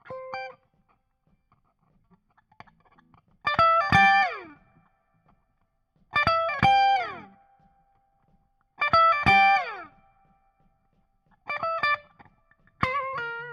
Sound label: Guit Solo Back